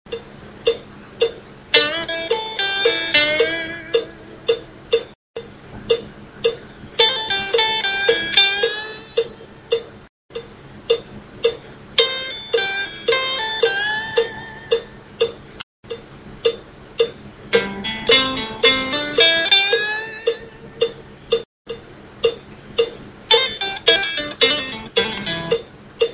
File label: hammer ons pull offs and tweedlies